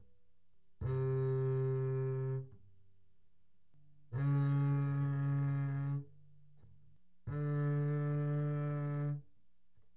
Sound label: Bass arco mf sul E C3 D3; spiffyscore